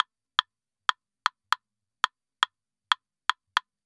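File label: CLAVES SALSA, salsa drums and percussion; Best Service ProSamples vol.14; AKAI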